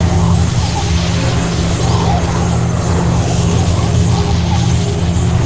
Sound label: Wing Lightning, ambience; Ninjago